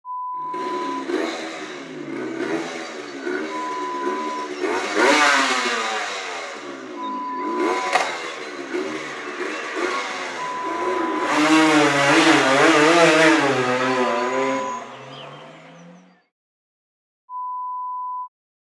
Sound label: Motorbike